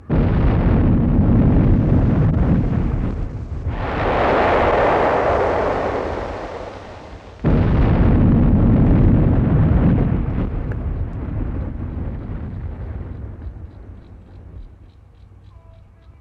Volcano Explosion With Debris Lava Rumble 2 Versions